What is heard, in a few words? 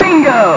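lemmings ship explosion; lemmingoids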